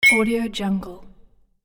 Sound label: collect game jewel